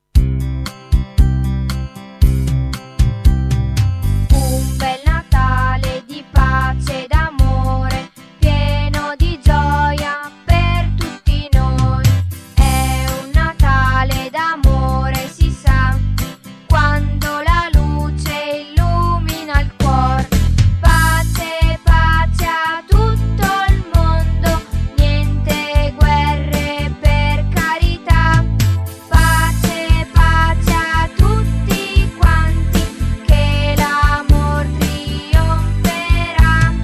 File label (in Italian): canto